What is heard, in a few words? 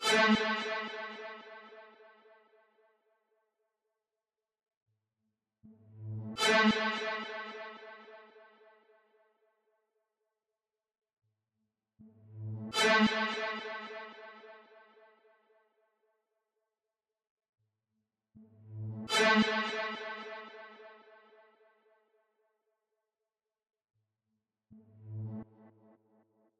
151 BPM G# Min Lead, Trap Melody; Astro